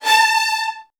A3, VN 16TH, Virtuoso Strings; ILIO; Kirk Hunter

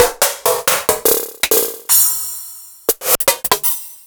Korg Triton BD kit

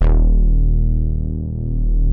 PROPHET 5; Hollywood Edge - Giorgio Moroder Rare Synthesizer Collection

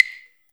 CLAVE; InVision Interactive; Percussion Slam

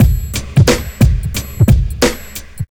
TRIP 90, trip loop

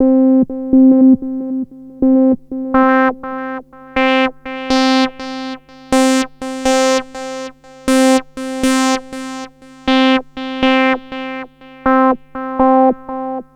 Korg Prophecy hits, arps and fx

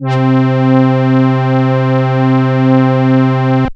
PAD C3, SCI Prophet-5 synth pad; Pads

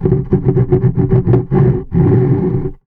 Hand saw, contact mic, Mechanical; musicradar; contact mic madness; Raw

